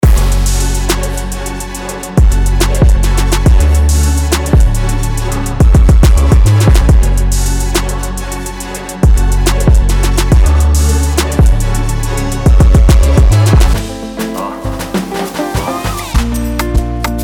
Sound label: cash rain bundle